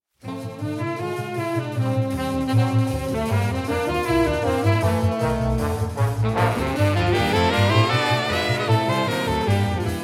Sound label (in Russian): koncertnyj estradny muzykalnoe vstuplenie